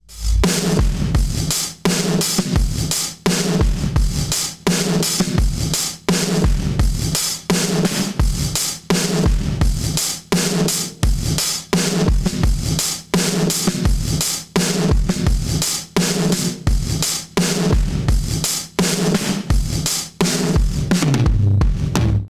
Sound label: drums